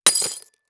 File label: Impact Glass Debris Hit By Medium Metal Pole